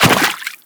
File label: run water, footsteps; Warframe; Teshin